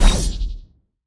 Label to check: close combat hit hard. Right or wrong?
Right